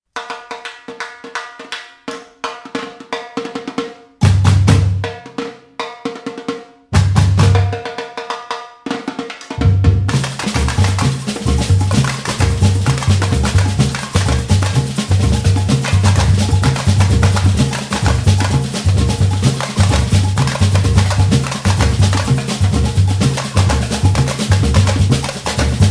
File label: brassband; Solar Music